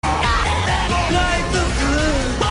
yooo

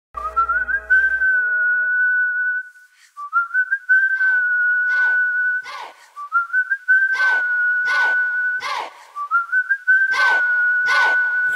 whistle

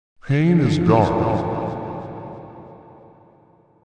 pain

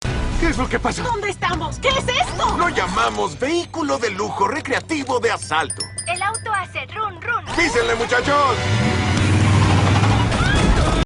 auto run run

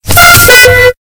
fail earrape; peppa pig

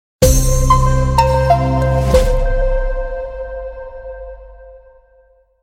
tv news opening

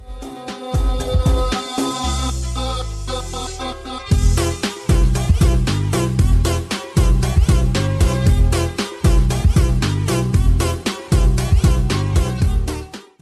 break beat

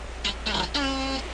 laser gun noises

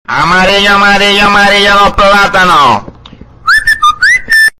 stairs

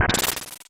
freeze

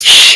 shoooooooooooshhhh

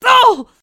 pain

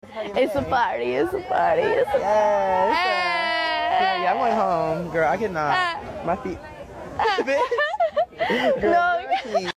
laugh

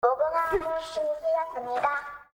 squid game doll sing

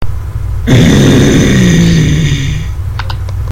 growls

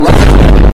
rock boom

